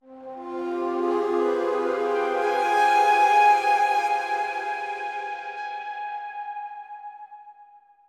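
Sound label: Synth F minor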